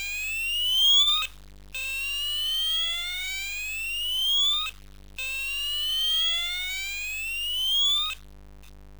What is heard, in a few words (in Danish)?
brandalarm Silkeborg